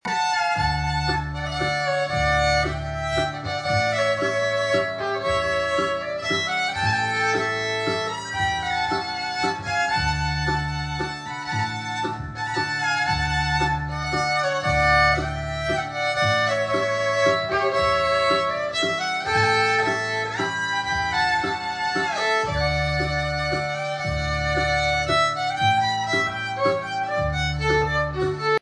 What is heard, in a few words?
german waltz, fiddle